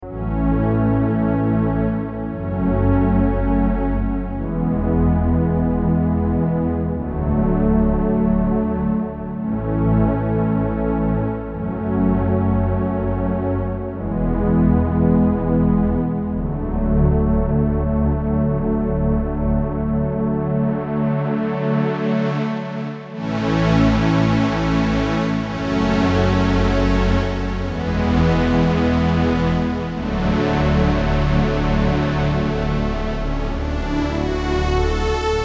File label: OBXA WARM STRING PAD